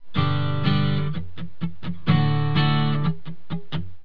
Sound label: f muting, guitar technique